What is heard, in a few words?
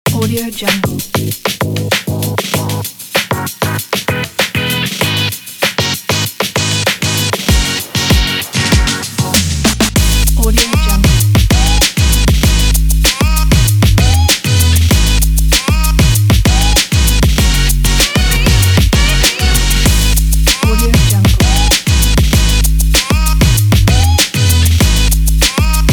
hiphopping